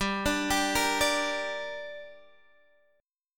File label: G Minor G down Guitar Half Step Down, chord